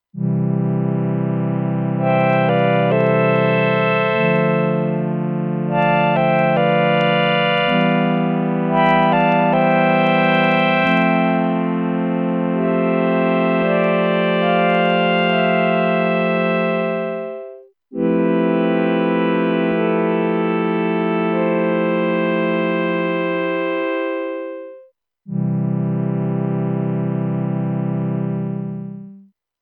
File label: PAD; sonicware liven xfm